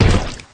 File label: limbs; halo; flood